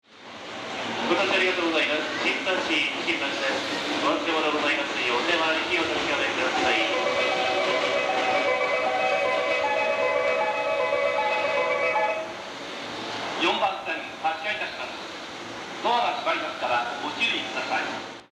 melody, yamanote; shimbashi; 新橋駅; 4番線（山手外）発車メロディ; jreast

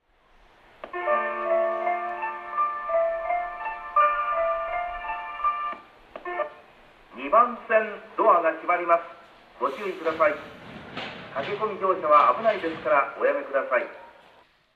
melody, station; mabashi; joban; jreast

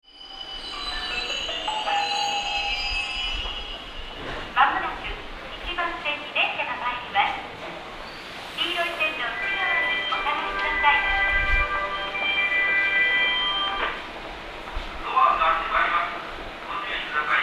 otsuka; 1番線（山手内）接近放送; jreast yamanote

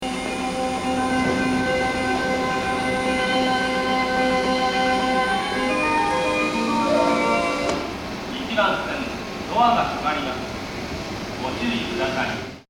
melody; 1番線（山手内）発車メロディ; 五反田駅; jreast yamanote

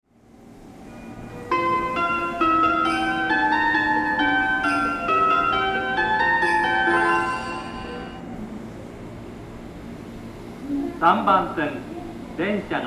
melody, station

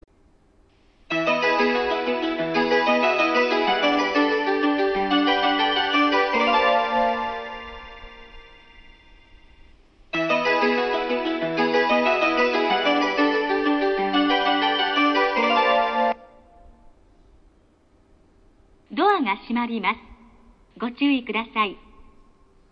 kumagaya melody, station; jreast

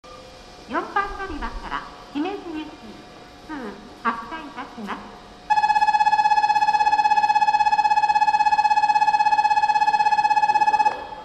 bell, station

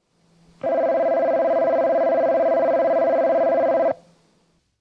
bell, station; keihan

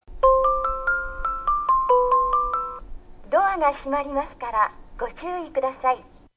musashitakahagi melody, station; hachiko; jreast

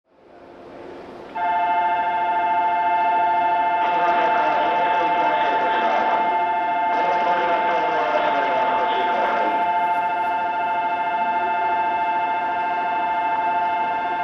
tokyo bell, station; jreast; tokaido

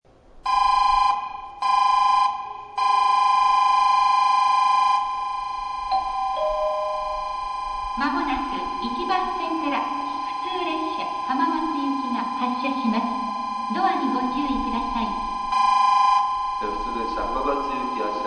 nagoya bell; jrcentral

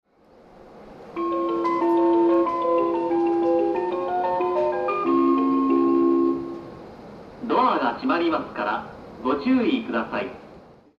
melody, station

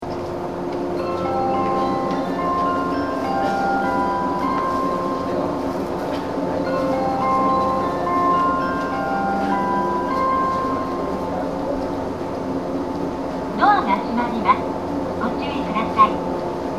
melody, station

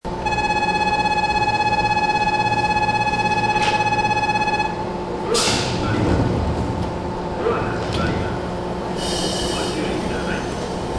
bell

